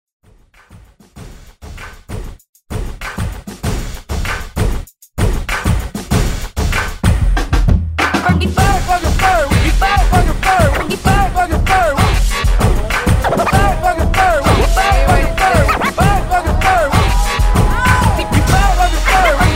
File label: Hype